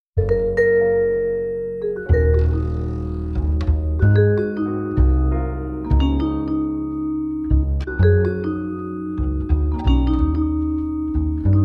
Jazz Fusion

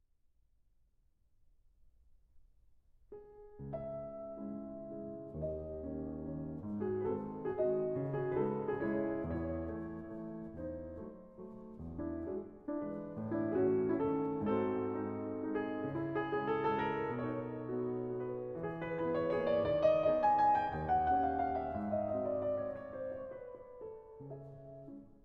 Waltzes In C-Sharp Minor Tempo Giusto

